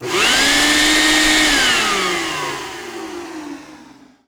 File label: Shop Vac On Off; Tools; 1001 Sound Effects